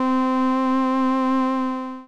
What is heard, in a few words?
saxmand stacked; reaper